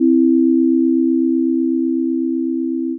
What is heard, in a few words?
chord intermod input